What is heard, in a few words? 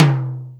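Hi Ring, Alesis D4 drum machine tom; 300 Drum Machines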